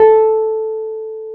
PNO T.Wurly A 5; Keyboards of The 60's and 70's

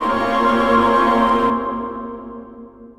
Pad

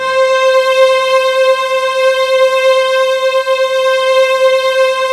SAD CELLO, JV-1080 ORCHESTR; Syntec; Wall of Sounds VOL-2; ORCHESTR-EXP

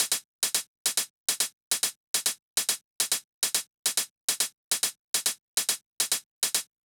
drum Loop 140BPM HH; Vengeance - Essential Clubsounds Vol.5; VEC5 Drumloops; VEC5 Multi Loops 140BPM

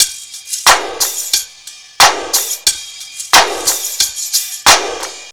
Percussion, R&B Drums

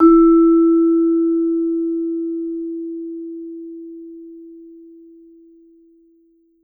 VMED E3, VIBE MED MF; Marimba & Vibes; Sampleheads; Dave Samuels

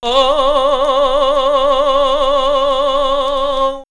Ethno Vox Islamic Prayer; vox mix; X-Static Goldmine

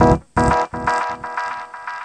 Delayed Rhodes; Big Fish Audio; OSH Chords

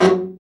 BRA BARI, Bariton Sax

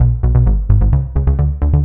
Bass Line; Eclectic Electronica

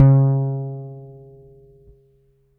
HOLLOW FRTLS D 4, Bass; Spectrasonics; Bass Legends

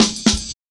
Jazz Ride A Cut, Drum Loops, 166.5; Zero-G Jungle Warfare 1, 2 & 3; 90 s Sample CDs

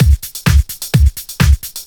Clubhouse Loop 128 BPM

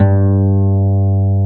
HARP G1

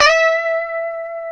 E 5, Guitars; Spectrasonics; Hans Zimmer Guitars VOL-1; 90's Sample CDs; COMP POP+VIB

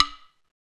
STICKS A; Roland - LA Composer vol. 1

